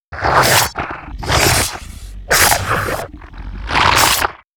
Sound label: Attack; Mars Sortie